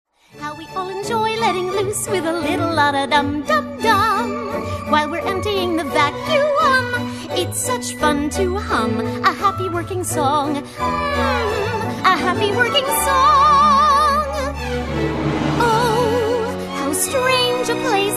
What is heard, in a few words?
karaoke vocal; princess; disney